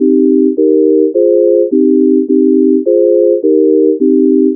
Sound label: piano 105bpm